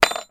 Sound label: industrial drill bit down on work bench; zapsplat